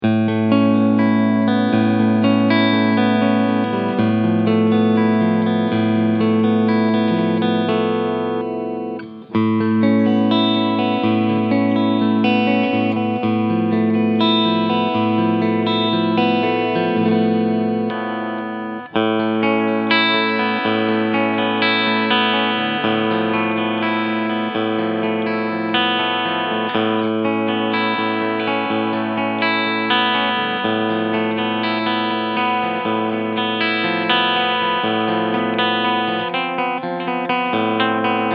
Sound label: Fusion T 25th Firemist alle Clean Sounds SC Modus; Harley Benton